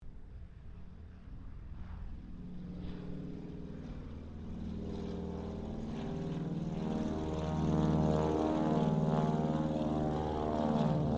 AIRCRAFT WINJEEL SQUADRON 2 TAKEOFF, 2 Australian Winjeel Trainers; Airplanes; Vehicles